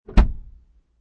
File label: Transportation Automobiles, Car Interior, 1996 Acura Integra, Trunk Popped Open, No Engine